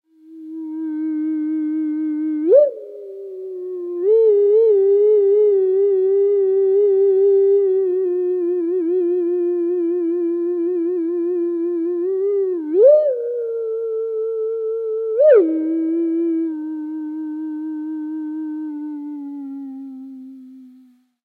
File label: Theremin; Back to the Future: The Game; Android; PS3; Wii; Windows; Xbox 360